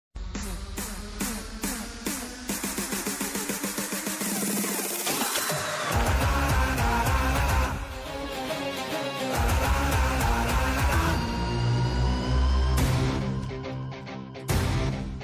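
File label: mass background music; ravanasura; mass maha raja ravi teja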